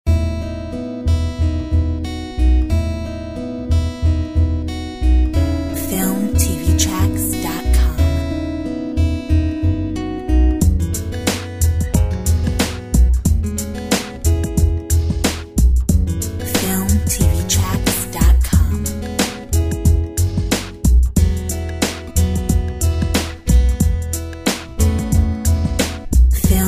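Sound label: R&B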